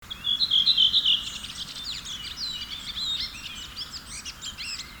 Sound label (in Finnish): Turili, Äijänsuo. Hyvin samanlainen kuin B. Tällä yksilöllä pitkä loppulivertely.; Espoon - Helsingin raja-alueilta alkukesästä 2008; Linnut